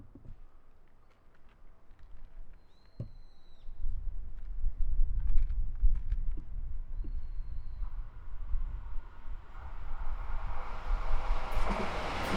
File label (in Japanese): 下り電車通過。 続いて上り電車通過。; 録音したファイルを、本体でノーマライズしました。; H5studio 内蔵マイク＋ZOOM ヘアリーウィンドスクリーン WSU-1